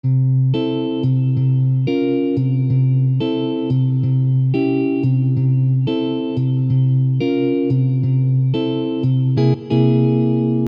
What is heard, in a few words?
pedal intro